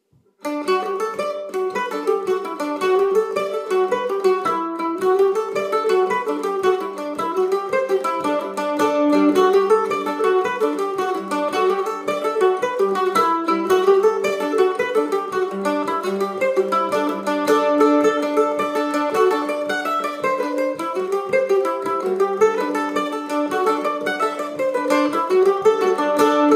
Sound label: olearys motorbike, irish mandolin; Listen to Lawrence play O'Leary's Motorbike